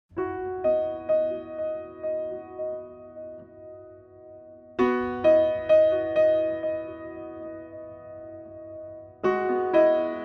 close mics

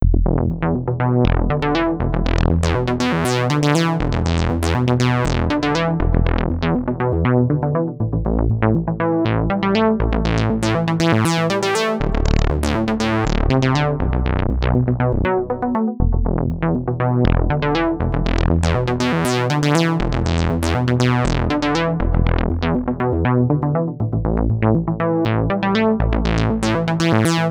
gaussian filter; fathom

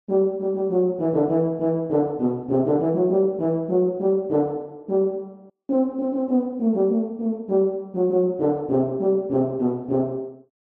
tuba staccato

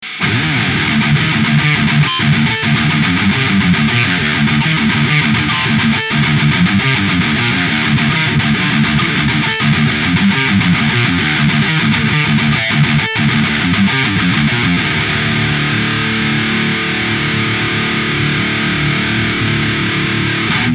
metal tone

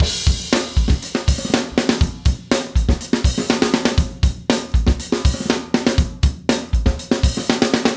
4 bar loop

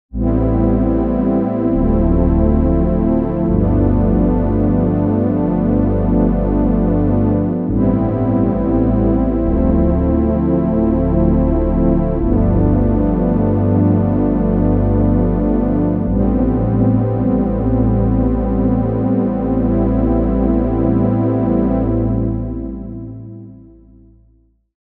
OB8 warm pad; opx pro3

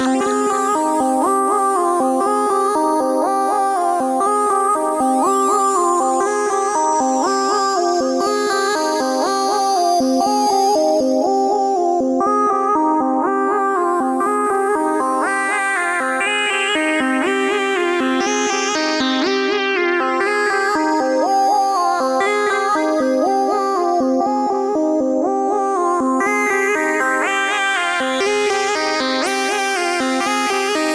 melodic enchantment

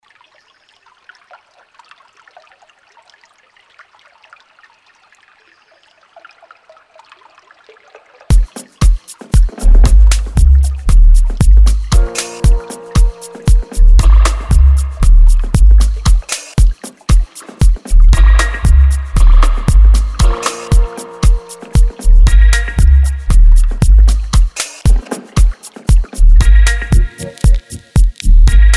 dubby

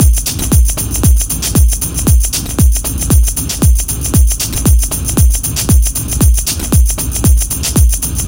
dub techno loops loop

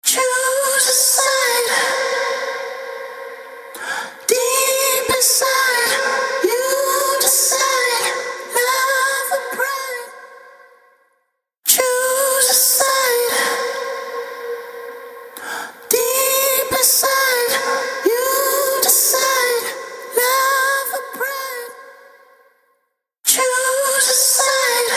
instant flanger mk ii

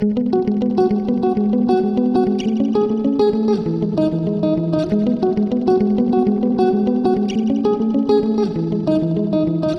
guitar ghostly

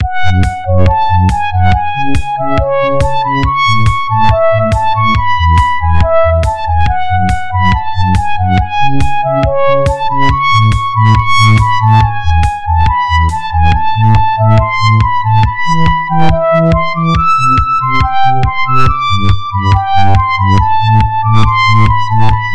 lead eclectic machine

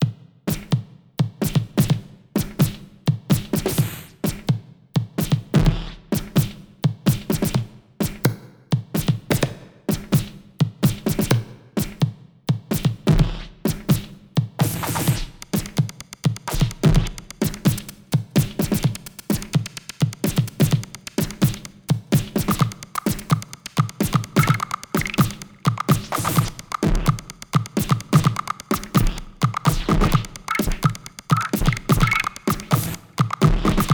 robo kit

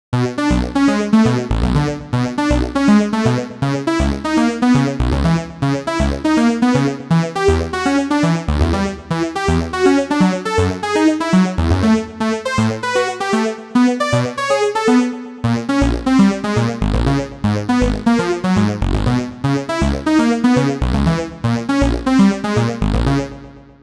seq electromagnetic deep

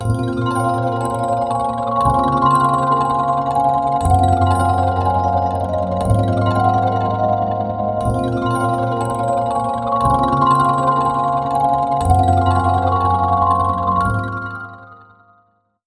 electric dyno waterange; electricdyno mkv 2.0

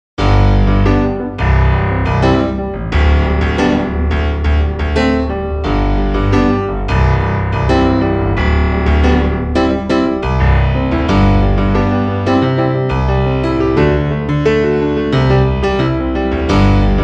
bass funk

